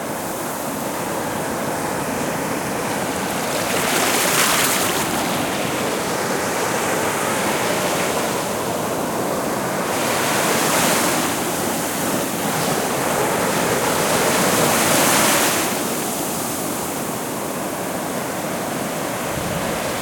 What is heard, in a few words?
beach loop; SnootGame